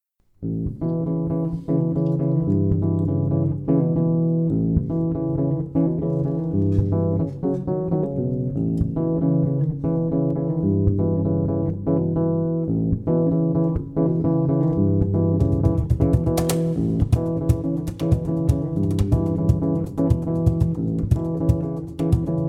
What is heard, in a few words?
rumba; mure revival